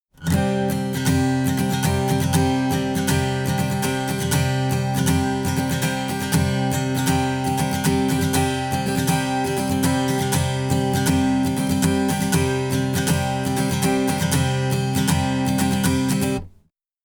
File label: comparing mic pre ag; rme